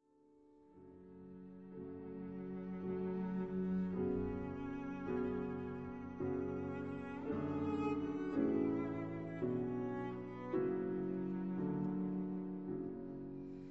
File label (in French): Quintette